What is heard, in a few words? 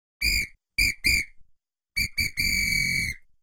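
whistle; Smeshariki Cartoon Studio; Svistok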